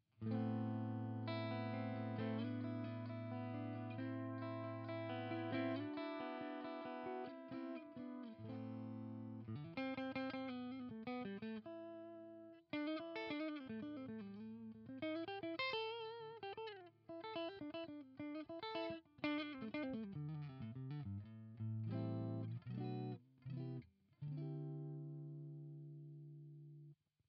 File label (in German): Harley Benton CST 24HB clean SPLIT hals